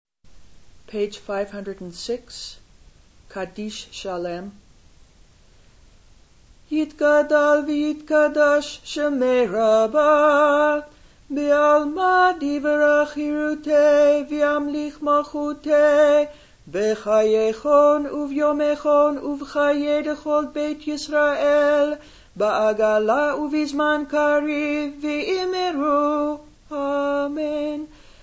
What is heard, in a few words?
Kaddish Shalem